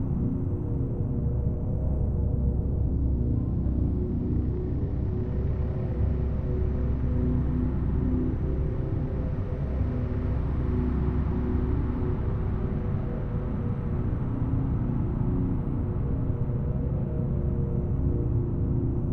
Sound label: ship colossus idle; Stellaris; toxoids; ships